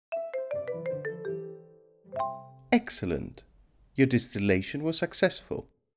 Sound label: music EXCELLENT